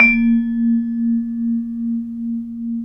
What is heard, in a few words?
VIBRAPHON; ILIO